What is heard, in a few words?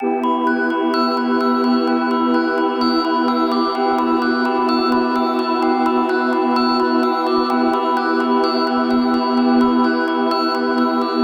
bells; sound system